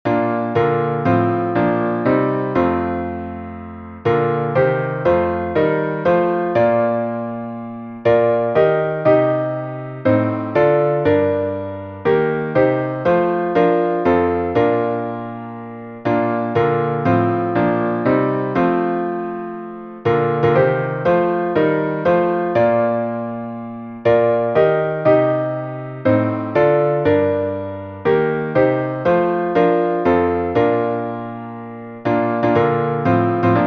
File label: national anthem; DEMOCRATIC REPUBLIC OF THE YORUBA